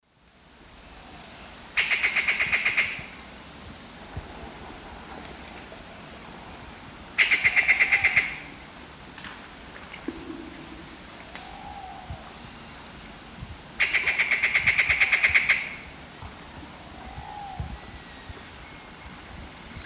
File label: Megaceryle torquata, calls; S. America; Eastern Lowlands